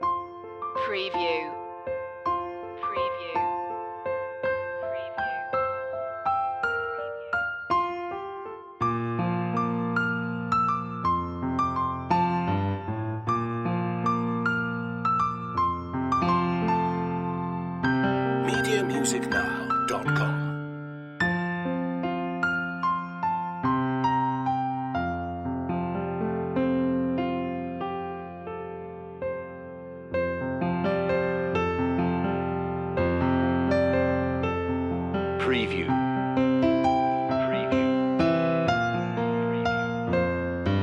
silent night piano loop long; media music now

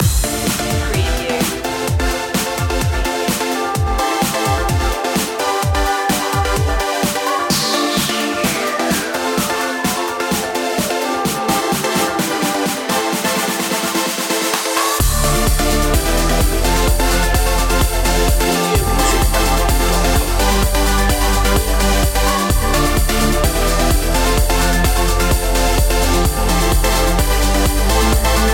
trance

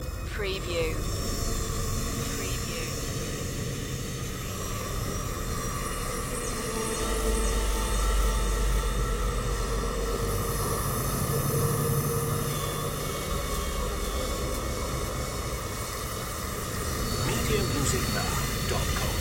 ghost buzz ambience loop

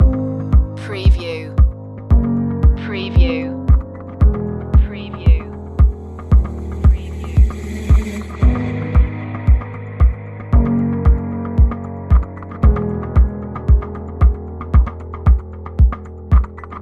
searching for peace loop

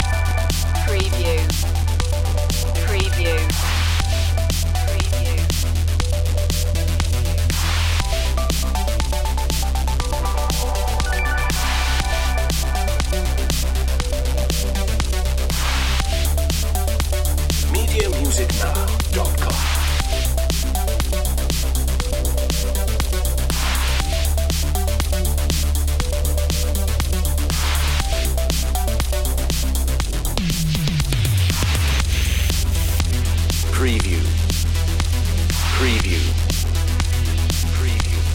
synthesis long looping

